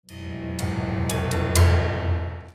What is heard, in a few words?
MUSICAL